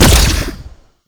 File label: Fire Shot; Duviri; Incarnon Dera Vandal; Weapons